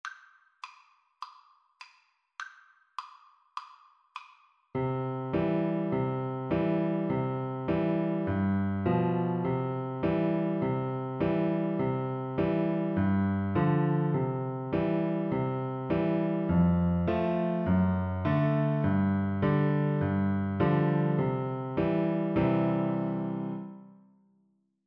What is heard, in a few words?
yankeedoodle, oboe